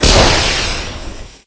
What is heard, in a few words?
rocket